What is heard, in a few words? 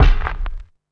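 MISC Right, Techno 138 BPM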